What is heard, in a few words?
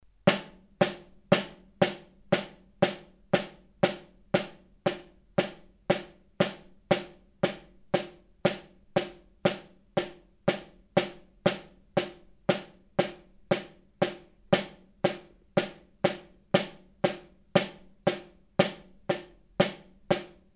snare